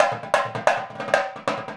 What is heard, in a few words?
Indian Drum; Sonic Foundry (Sony Creative Software); Essential Percussion; Drums